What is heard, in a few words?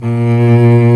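STRINGS SOLO, Chamber Grp; Roland L-CD702; Combos 4; Chamber Grp2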